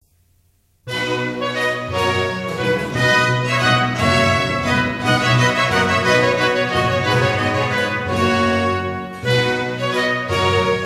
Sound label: Trumpet tune; King Arthur; Henry Purcell